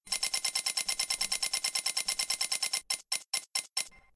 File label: wheel spin